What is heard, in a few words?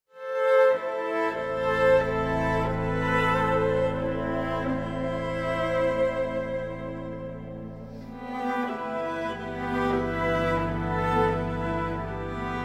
Exploration Night Strings